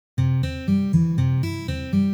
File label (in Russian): Nishodyashhie patterny